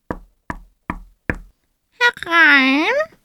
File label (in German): Klopfen am Hexenhaus; Radio AG